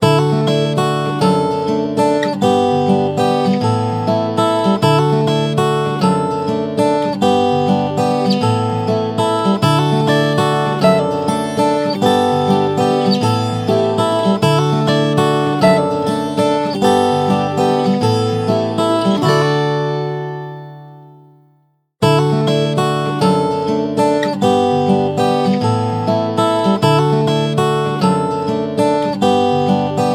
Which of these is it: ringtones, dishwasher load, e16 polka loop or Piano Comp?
ringtones